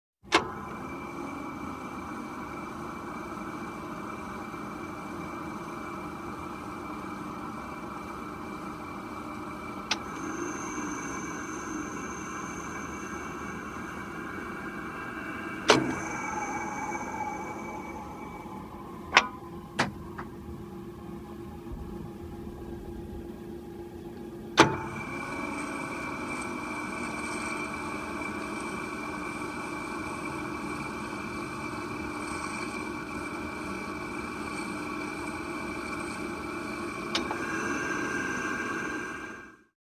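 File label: fan spinning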